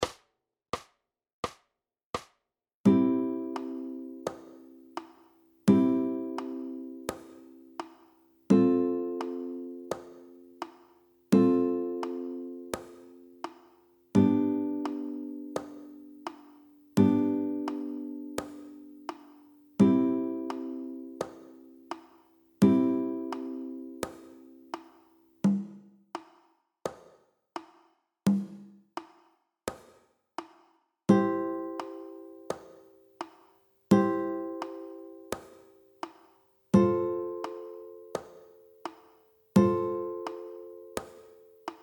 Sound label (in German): Highchords Akkordverbindungen Saite 1 3 C F G C, gitarre